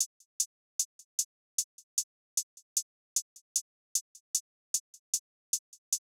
Drum Loop 152 BPM Hihat, Hip Hop; Drum Loops; Loop Stems; Cymatics; Truck Drum Loop

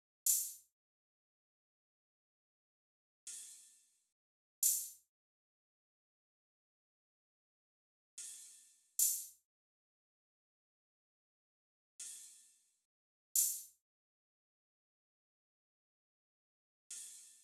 Drum Loop 110 BPM Open Hat; Cymatics; Vibes II Drum Loop Collection; Avenue

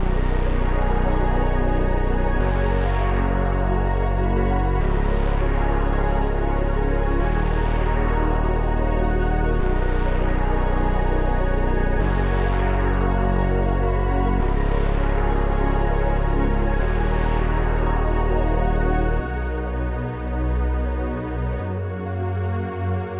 100 BPM D# Min, Melodies, Pop; Broken Heart

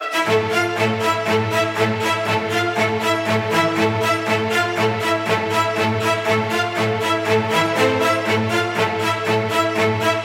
Violin Ostinato - Am - 120BPM; Construction Kits; STEMS; Ghosthack; SkyRealm; Kit 09 - Shallow Valley

